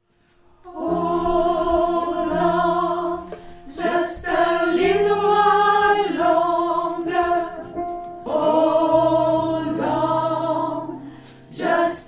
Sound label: soprano